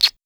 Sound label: little cute kiss; mixkit; Karacsonyi tortenetek